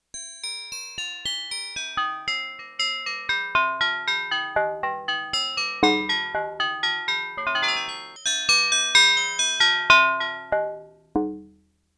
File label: Wind Chimes